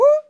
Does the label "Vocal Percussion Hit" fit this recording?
Yes